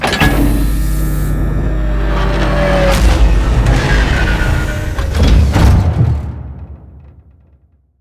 land, vehicle

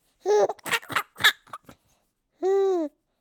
laugh, birthday; CosmicRage; CosmicRageSounds

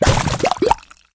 slime; CosmicRage

